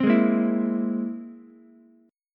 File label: C Augmented 7th, Ukulele; strummed; JGuitar